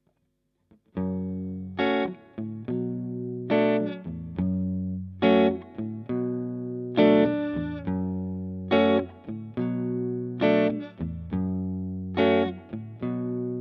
clair sans rien manche deep; bogner alchemist

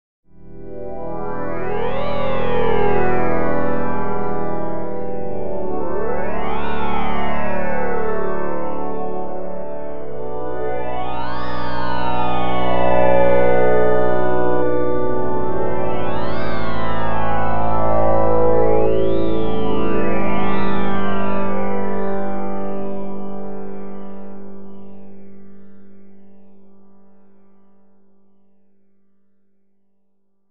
nappe; propellerhead; reason4

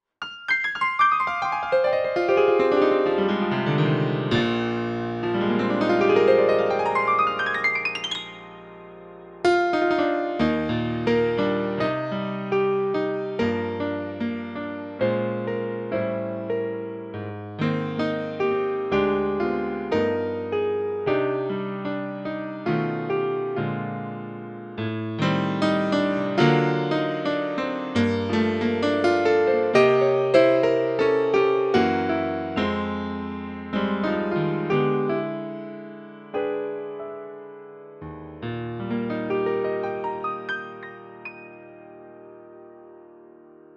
fichier test akoustik piano concert grand steinway; native instruments; the grand

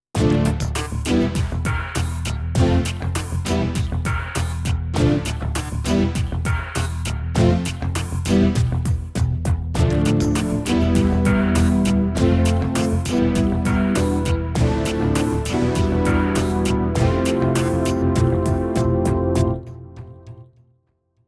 son mix combi epop, korg m50

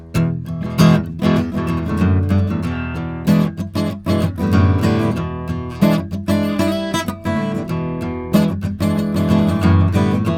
guit la610; pendulum; quartet

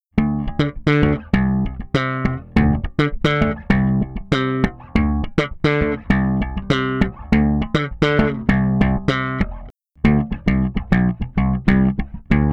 reglage plat gain 0 fond slap, bass; smpro

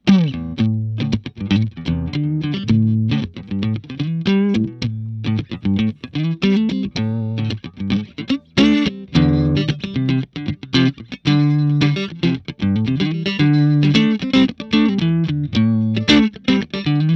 clair strat; egnater

